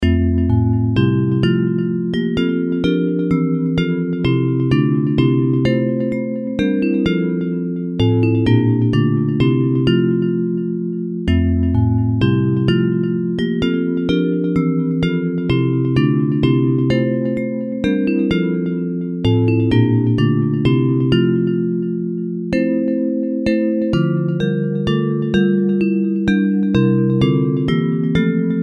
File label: Bells; Hymns